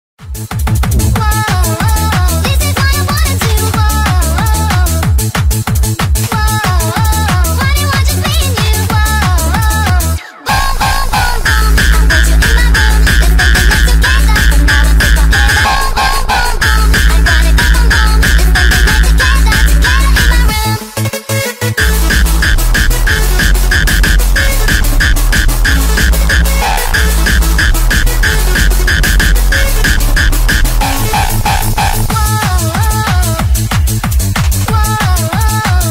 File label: hardstyle sped up